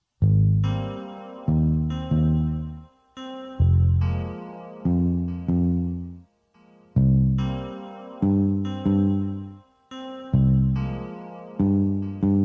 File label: cellos